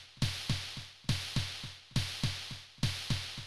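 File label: DISTANT snare drum, Techno 138 BPM; Best Service ProSamples vol.54; AKAI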